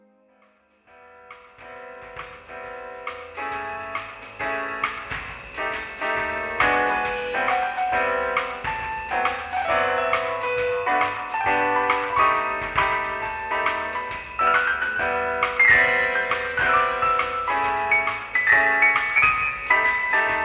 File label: Intermezzo Jazz